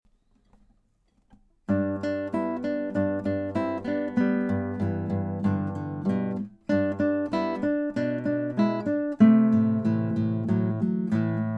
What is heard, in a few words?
Happy Monday duet